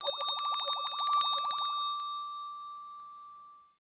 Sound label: incoming call, Skype WiFi Phone; Netgear; SPH101